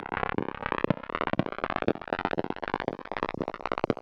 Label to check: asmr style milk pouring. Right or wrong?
Wrong